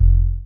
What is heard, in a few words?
beaver, Bass